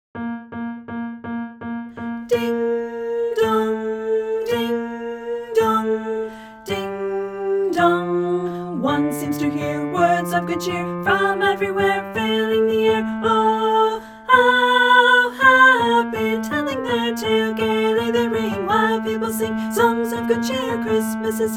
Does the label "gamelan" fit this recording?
No